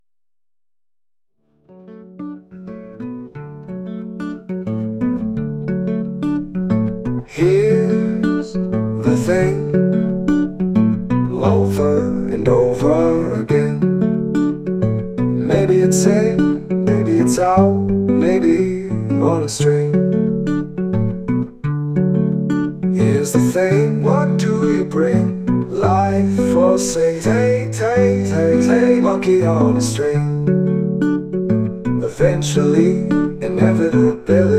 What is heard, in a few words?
acoustic interlude